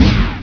gun; asciisec

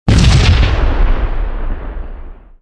Explosion; GLScene; AirBlast

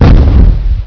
m explode; asciisec

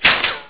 Small Impact; GLScene; AirBlast

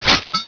stab; asciisec

